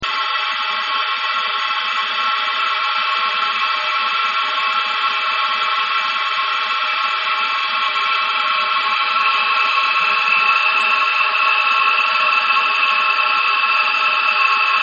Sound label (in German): Hydrant undicht - Horchprobe